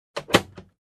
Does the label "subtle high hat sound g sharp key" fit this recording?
No